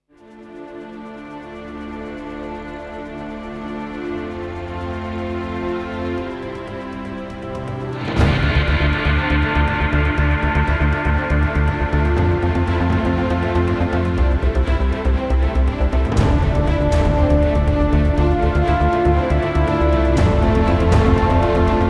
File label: No Choir - No Synth